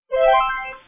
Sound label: upgrade; plane fighting